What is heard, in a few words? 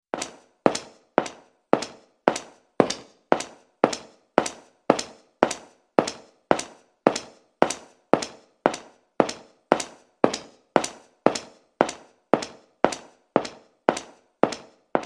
Footsteps